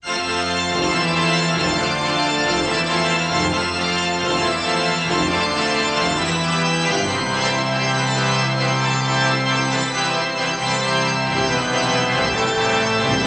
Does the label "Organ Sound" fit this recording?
Yes